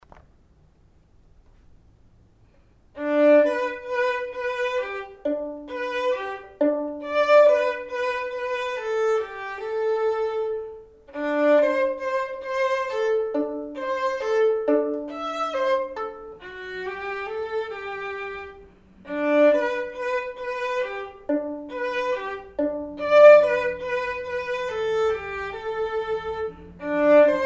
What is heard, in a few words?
perf vln